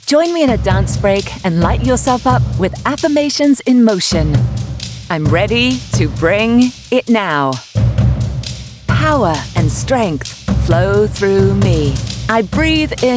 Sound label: De-Stress Dance Break